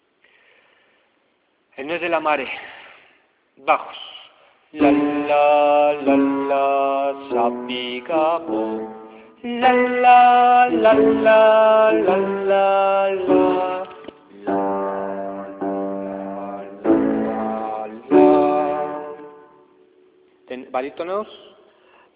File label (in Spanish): bajo